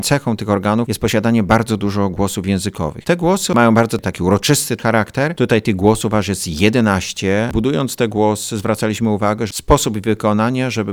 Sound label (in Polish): organy